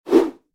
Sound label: enemy descent; TacoInvaders